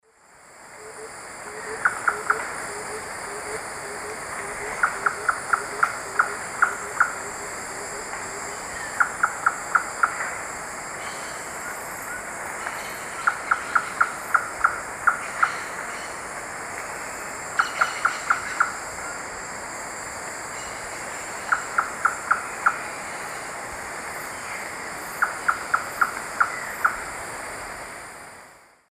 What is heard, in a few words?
Trogon viridis, calls female; S. America; Eastern Lowlands